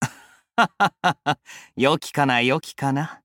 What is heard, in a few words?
Login; Mikazuki